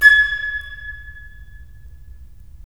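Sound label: FL, flute; LA Composer vol. 3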